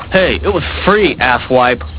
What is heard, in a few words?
mobile polyphonic ringtones